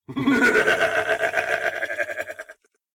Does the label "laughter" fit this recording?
Yes